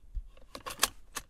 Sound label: seatbelt on, vehicle; cloud/sound/vehicle